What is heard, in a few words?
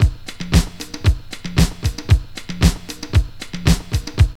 DISCO LOOP; Zero-G; Funky Elements